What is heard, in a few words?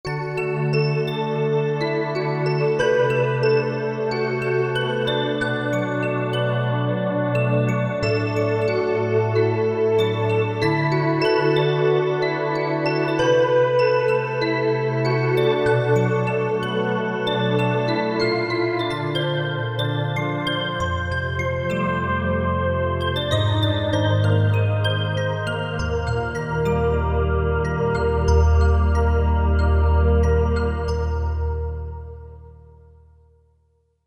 Bell Organ